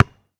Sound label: Street Ball Bounce Dirt, Street Basketball